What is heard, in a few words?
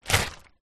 small plastic bag filled with water pick up from wood surface; foley; zapsplat